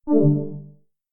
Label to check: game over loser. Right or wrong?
Right